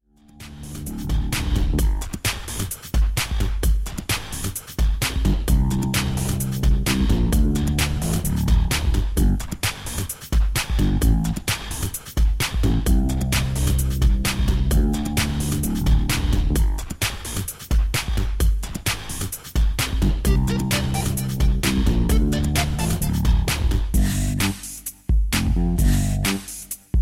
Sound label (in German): rap playback